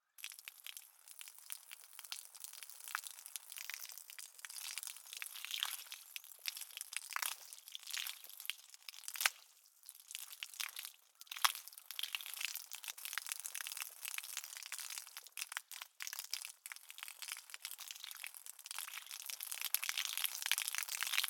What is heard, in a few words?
Water Hose on Concrete